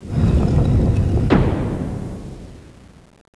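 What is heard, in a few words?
stone door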